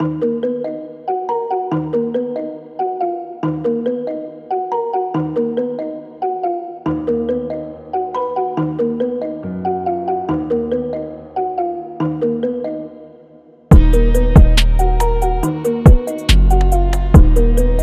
kalimba

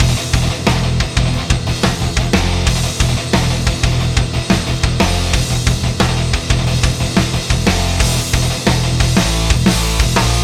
thrash di; vortex cannon

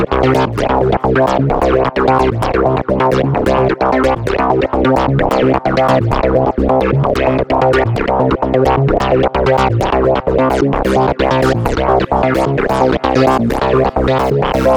melodic enchantment

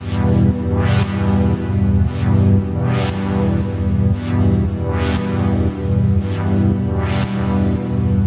dub techno loops loop

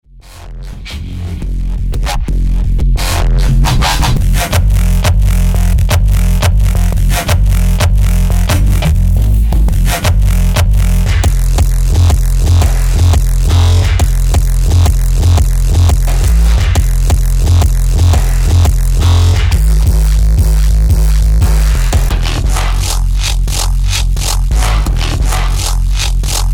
bass

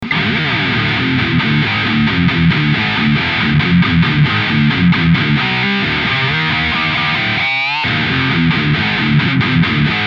metal tone

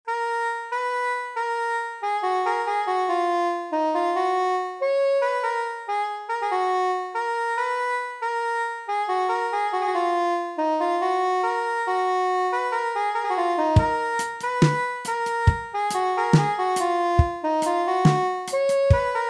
brass soprano

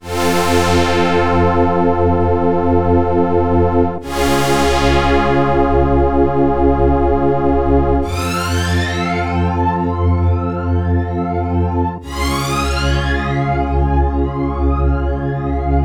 eternal on synth strings

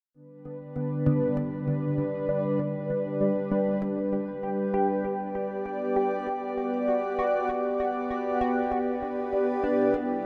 pads in motion; wave cre8

